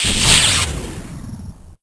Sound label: Windows PDA Startup; Windows Never Released Soundpack 2